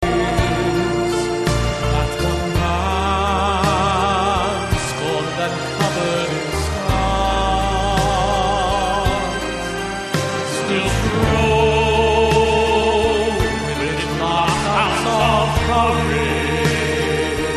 tenors

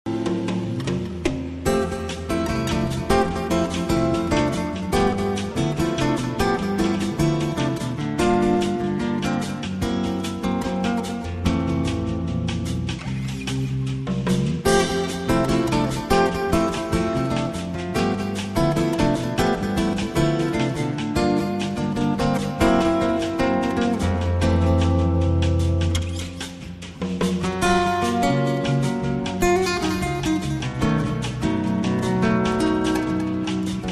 Latin Jazz

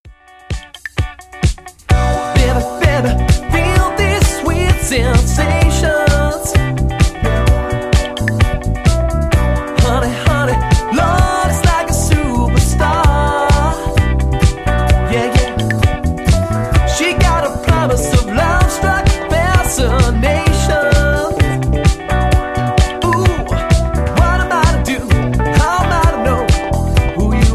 groove

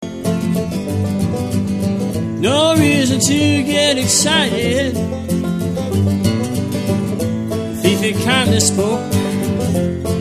acoustic

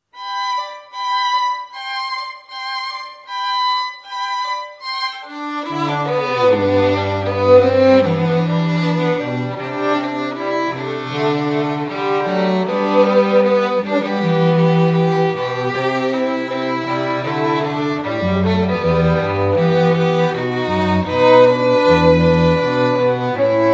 Strings

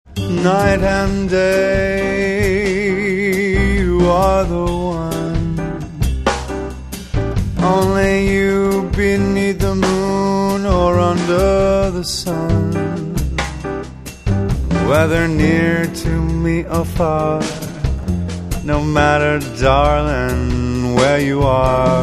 swing

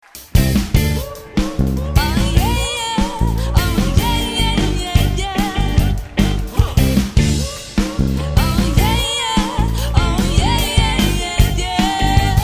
jazz

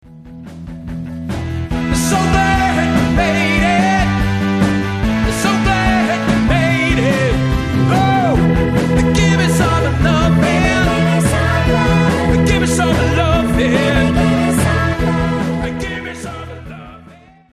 Bassline

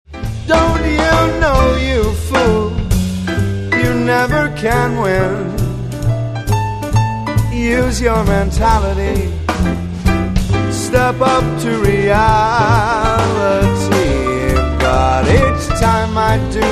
swing

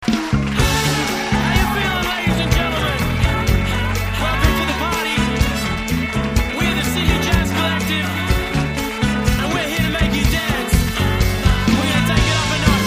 jazz

